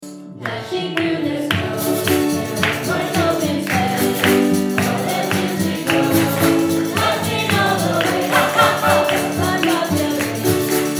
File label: Jingle Bells